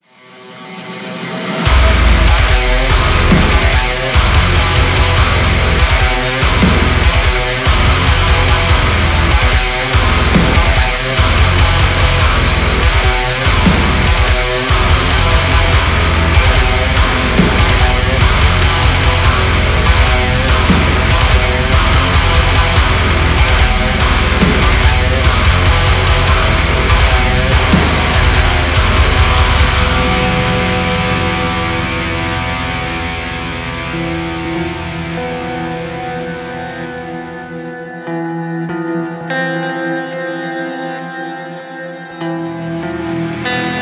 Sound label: instrumental